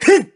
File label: huh male, Voice